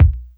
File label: watery, Kicks; Medium